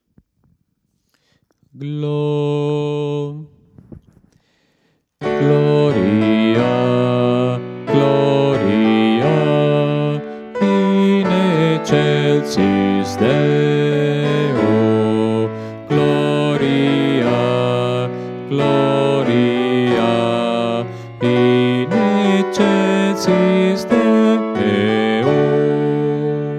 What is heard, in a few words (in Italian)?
basso; gloria di lourdes